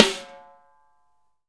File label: SNARES DRY; Real Mega Drums